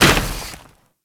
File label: Moa Combined Step Snow, Footsteps; Warframe